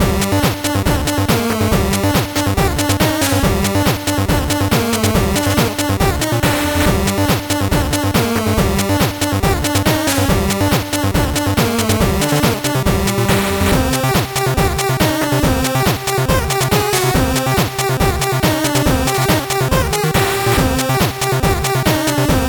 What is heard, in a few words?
8bit bgm normal